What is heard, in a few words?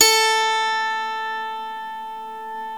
SWEDISH LY, Swedish Lyre; E-MU Producer Series Vol. 2 – More Studio Essentials; Composer